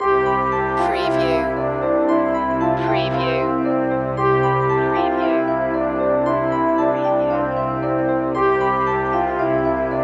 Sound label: xmas loop